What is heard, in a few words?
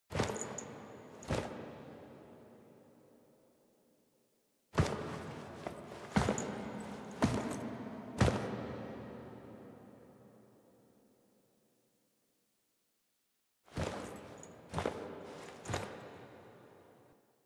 Foley D; Warframe; Quests; The New War; Sealab; Hunhows Lair; Drifter